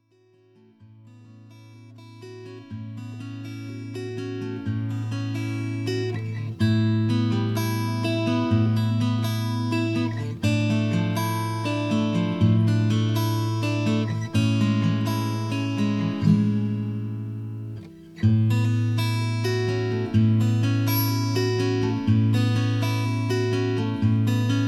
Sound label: Acoustic Guitar Stereo track